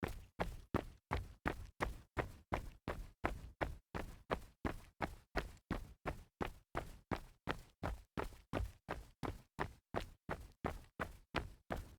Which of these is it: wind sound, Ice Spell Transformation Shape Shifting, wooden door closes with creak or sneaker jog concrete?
sneaker jog concrete